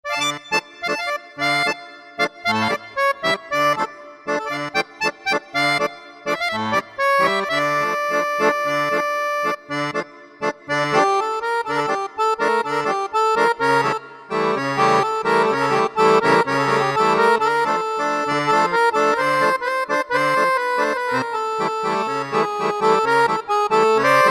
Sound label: Accordion, Yamaha PSR-3000; Factory Voices; Accordion & Harmonica